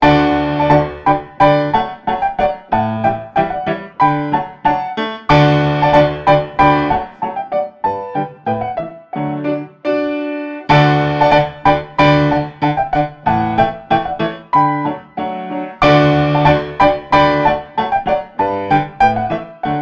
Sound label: Piano; Assassin's Creed Syndicate